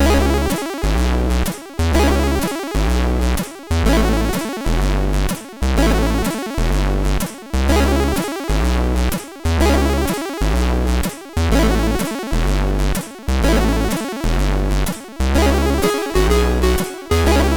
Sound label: Christmas, sid MOS6581R3; Christmas Shape